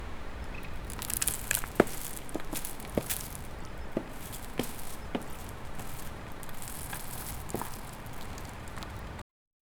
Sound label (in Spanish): una persona camina entre, stealthy